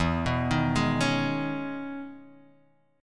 E Major 13th down Guitar Half Step Down

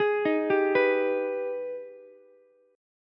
G sharp Minor Major 7th G sharp down Ukulele; arpeggiated; JGuitar

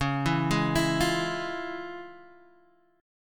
F Minor Major 7th Sharp 5th Db, guitar chord

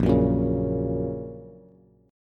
A sharp Minor Sharp 5th, down, Bass

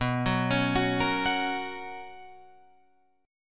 B Major 7th Suspended 2nd Guitar Open F, chord; BM7sus2; arpeggiated; JGuitar

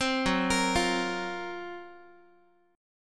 F sharp Major 7th Flat 5th, Guitar; F#M7b5 Chord; arpeggiated; 15,14,13,15,x,x

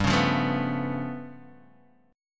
G 9th Flat 5th, Guitar DADGAD

